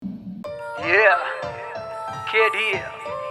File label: Success